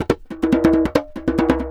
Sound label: Djembe loop 140 BPM; musicradar